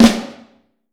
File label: Vintage Snare Drum; Q Up Arts; Johnny.C's Vintage Snare Drum; 4X14 JCSM